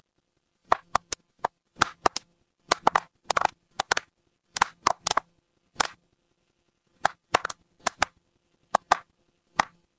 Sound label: ambience fireplace